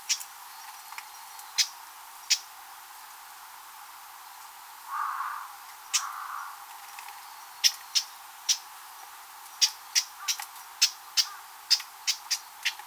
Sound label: uguisu